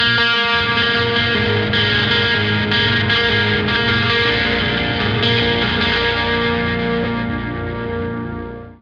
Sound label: Lake Hi Gtr B flat; torchlight iii; ps4